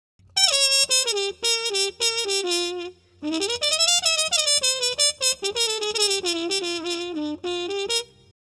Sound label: 110 Jazz Trumpet _Bbmin; Brass; Pro Tools Essential Loops; Digidesign